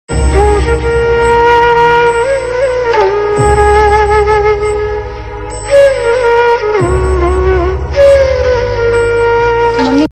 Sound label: Flute; Perft Bride